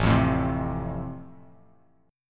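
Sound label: A Minor Sharp 5th, chord strum; Am#5 strummed; 5,8,7,5; JGuitar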